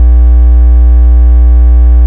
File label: buzz